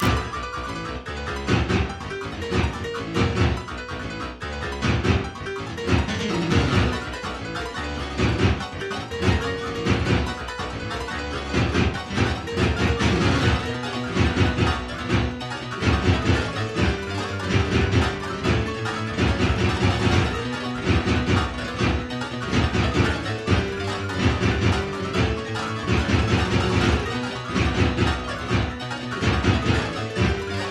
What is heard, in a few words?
Instrumental; Knuckles Part; Friday Night Funkin